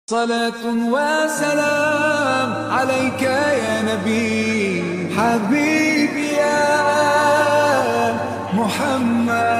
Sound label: islamic ringtone arabic ringtone